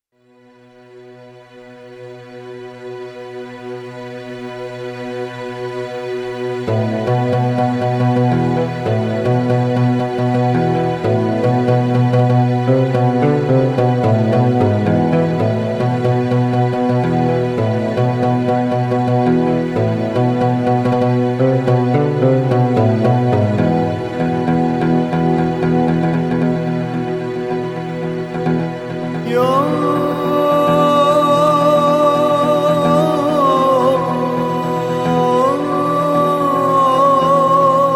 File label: Arabic